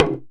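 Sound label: small tama hit; Best Service; World Colours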